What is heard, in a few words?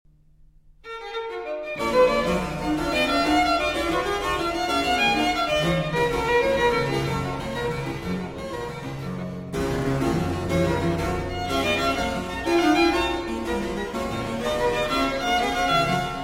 Violin Sonatas, Sonata in E Minor; 12 Violin Sonatas; No. 4 IV. Viste